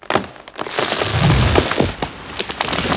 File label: treefall creak; World of Tanks Blitz